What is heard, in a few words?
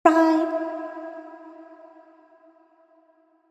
Short, vocals, dubstep and trap